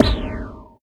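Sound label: bass drum, right; Zero-G - Chemical Beats; BASSDRUM ZOO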